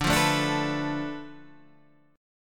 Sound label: B Minor 7th Flat 5th D, guitar chord